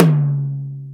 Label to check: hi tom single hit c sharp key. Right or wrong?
Right